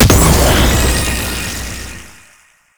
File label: Antimatter Drop; Warframe; Nineteeen Ninety Nine; Deep Archimedia